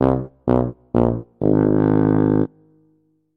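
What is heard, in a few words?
tuba sounds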